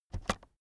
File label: keyboard external space press fast soft